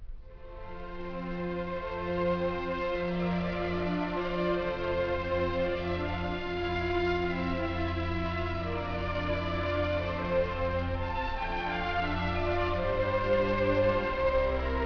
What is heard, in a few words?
mozart piano